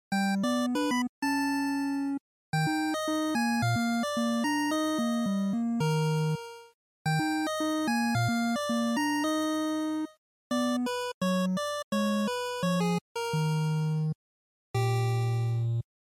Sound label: NES Action